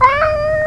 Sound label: lonely meow